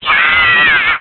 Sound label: Burn; Link getting burned